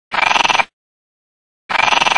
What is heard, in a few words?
bird